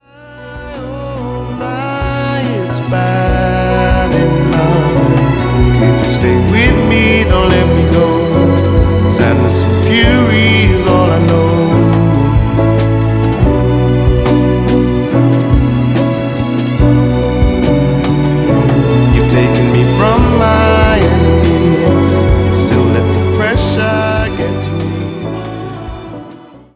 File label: Piano